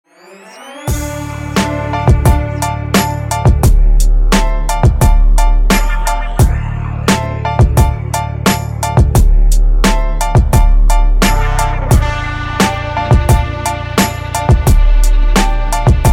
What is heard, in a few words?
gangsta rap instrumental